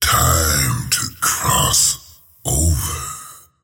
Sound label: attack pain; slyrak; dota